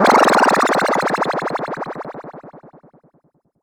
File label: Modular Fx Down